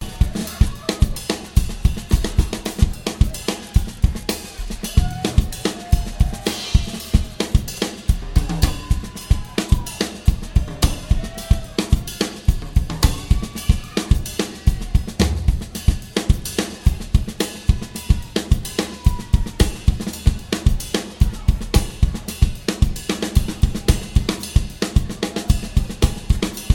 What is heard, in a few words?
Drums